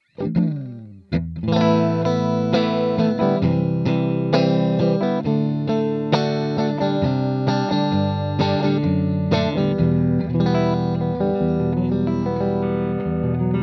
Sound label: Strat DI Line in plus OD